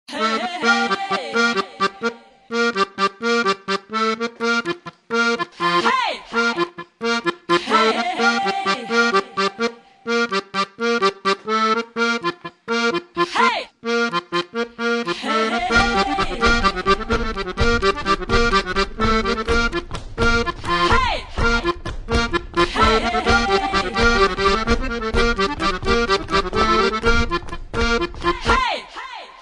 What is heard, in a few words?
accordion intro